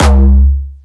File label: C#2, Jumpstyle Kick Solo; Vengeance Samples; Bassdrums; Jumpstyle Multis